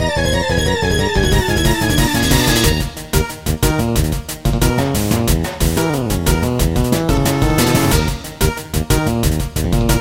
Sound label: Wild Battle; Pokemon FireRed and LeafGreen Remastered; gba